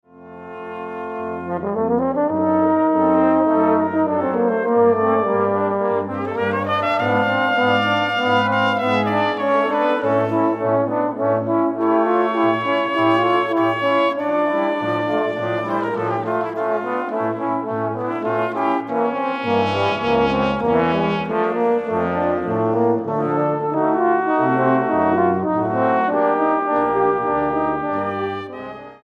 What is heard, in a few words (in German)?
Sonata in B-Dur